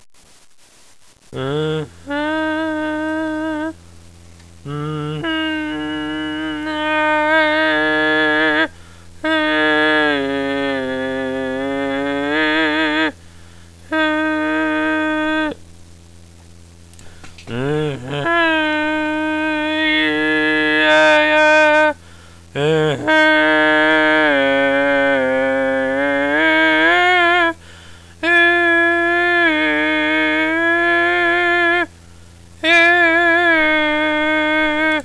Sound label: 2 octave